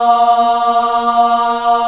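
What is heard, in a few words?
A#2 child ah left, Choir; USB Soundscan vol.28; Acoustic & Synth; AKAI; CHILD AHF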